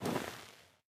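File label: movement armor; smite; pele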